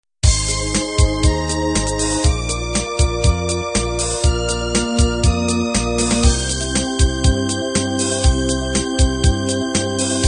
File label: 8bt analog end